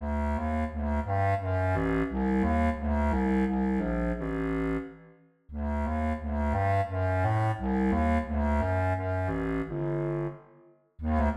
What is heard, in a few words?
Contra Bass Clarinet